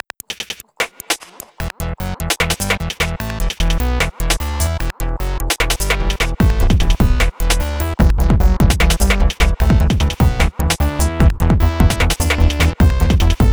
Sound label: American Techno